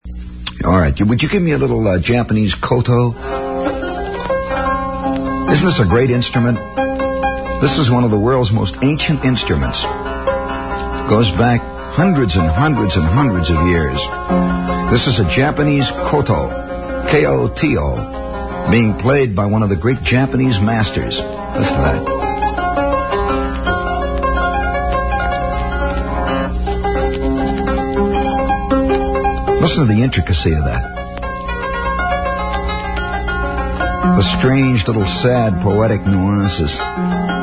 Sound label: koto